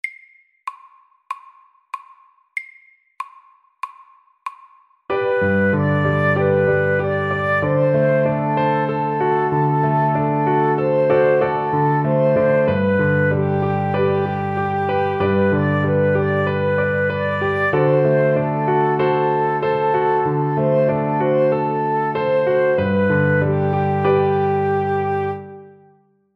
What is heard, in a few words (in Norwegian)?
Walesisk melodi MIDDELS